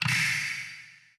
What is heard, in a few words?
drag off slot